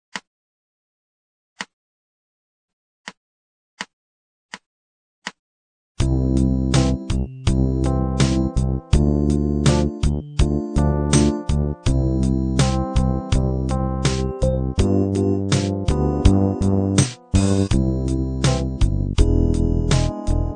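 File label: vibrato minus, harp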